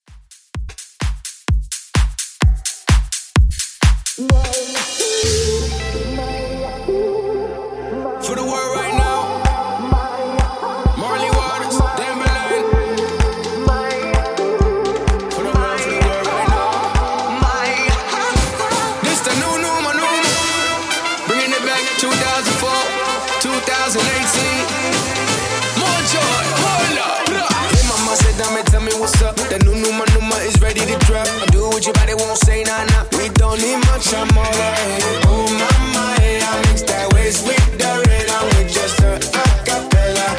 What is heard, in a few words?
Dance Redrum 128Bpm